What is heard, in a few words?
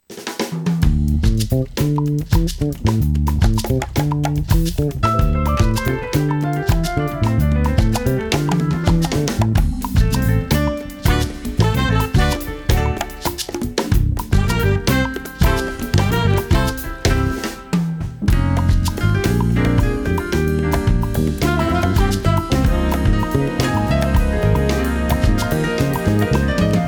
Rumba